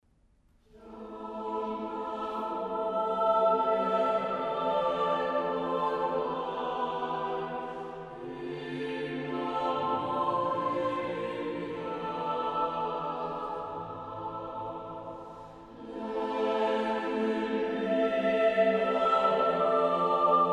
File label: schola